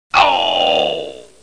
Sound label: Low pain